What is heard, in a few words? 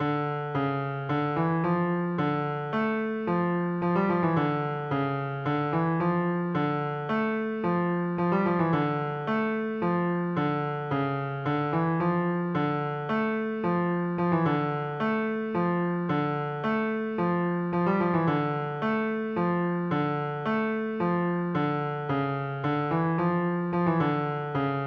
fugue